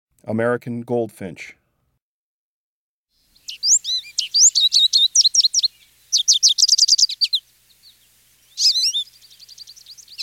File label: American Goldfinch; Breeding Birds of the Chicago Region